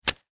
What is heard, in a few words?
Scorpion Tail Sting, Scorpion, Tail, Stinger, Strike, Impact, Attack, Arachnid; Scorpion Sound Effects; Blastwave